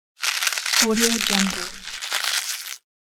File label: rubber; teasound